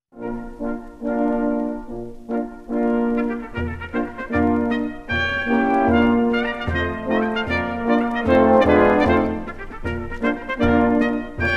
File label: Schottische Quadrille